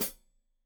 Hat Foot, Hi-Hat, Cymbals Stereo

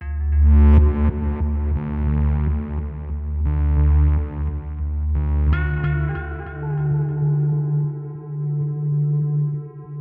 Atmos; neuro; Optiv & BTK

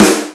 KGT1, Korg-T1, Drums

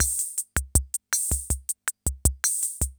CR78; Best Service; XX Large Most Wanted

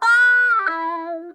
SPINDLY, Funk Guitar; Zero G - Funk Guitar

